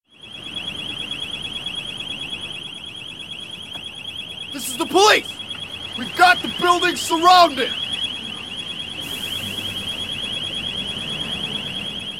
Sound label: warehouse alert